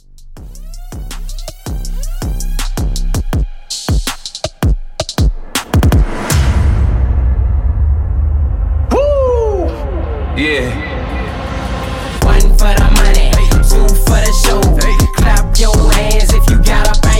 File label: Hype Re Drum 81; remixplanet